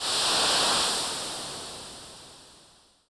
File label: Explosion